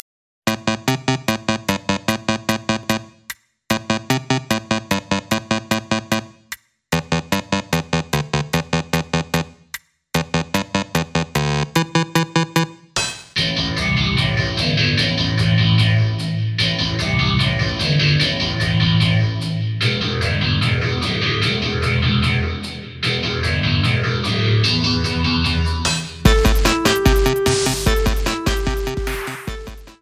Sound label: Rhythm Code